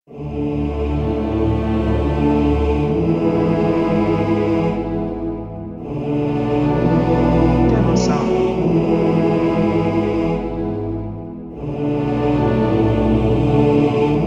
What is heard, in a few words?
Holy Sanctuary Chanting Monks